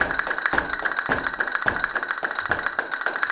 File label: CASTANETS, Flamenco